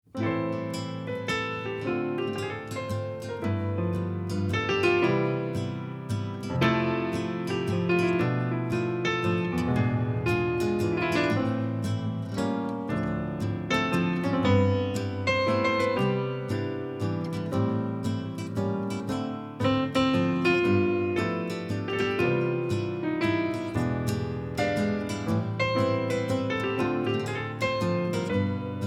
guitar & piano; Neon Genesis Evangelion; Evangelion S2 Works

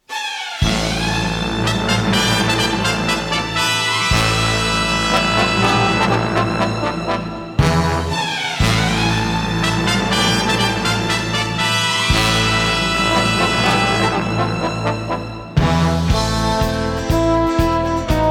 without harp; Neon Genesis Evangelion; Evangelion S2 Works

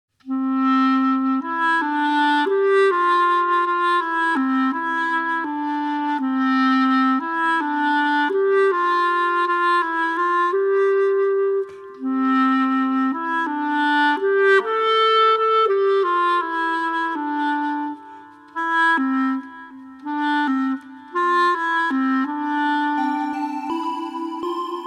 clarinet dake; Onegai Twins; Complete Soundtrack

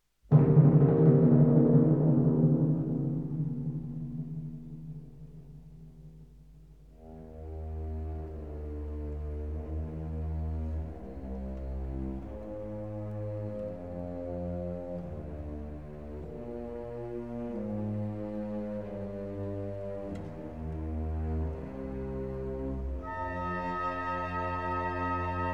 Symphony No. 103 first movement; roll a drum (Haydn); Legend of Galactic Heroes; Galactic Empire SIDE

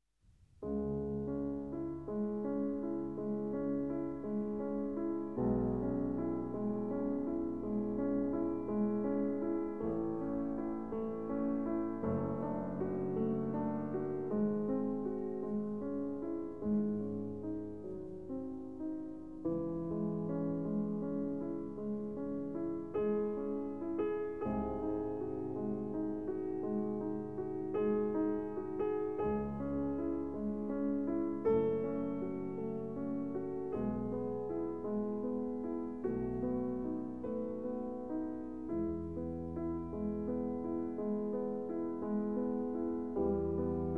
Piano Sonata No. 14 in C minor first movement; moonlight; Legend of Galactic Heroes; Galactic Empire SIDE

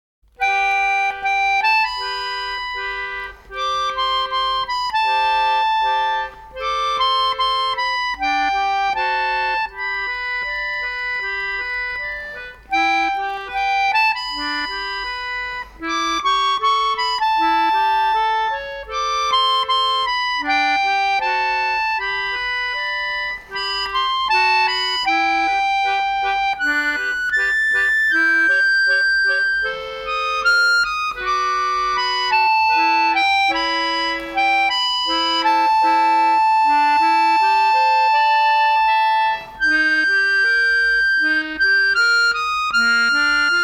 Accordion Version; Ojamajo Doremi

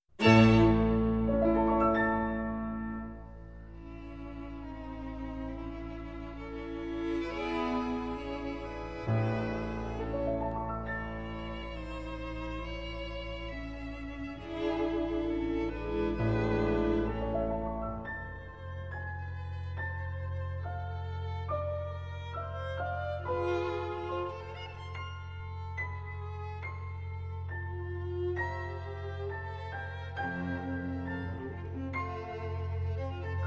Piano Quintet; Trout first movement (Schubert); Legend of Galactic Heroes; Free Planets Alliance SIDE

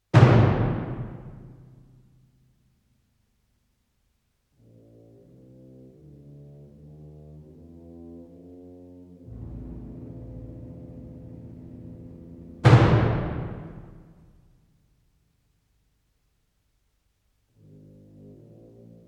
Symphony No. 10; F sharp turn Major fifth movement (Mahler); Legend of Galactic Heroes; Galactic Empire SIDE

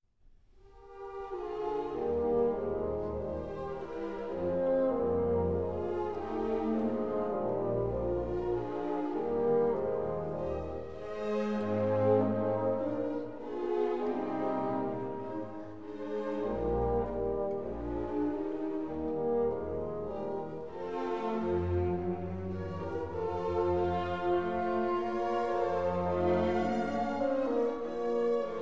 Symphony No. 25 in G minor second movement; Mozart; Legend of Galactic Heroes; Galactic Empire SIDE

